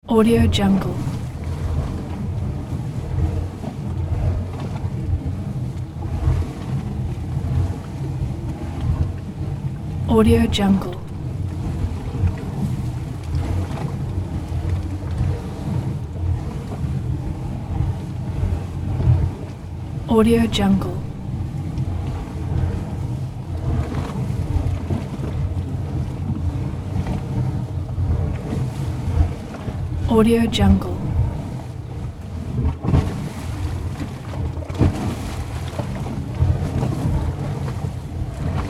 active volcano; sound ideas

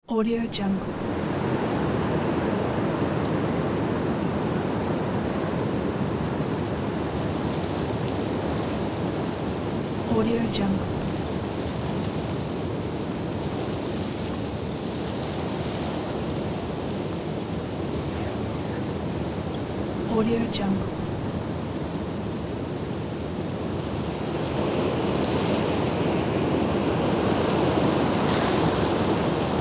strong wind through tall grass; sound ideas

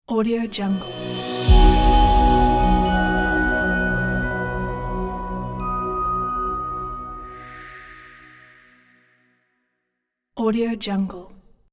airy logo

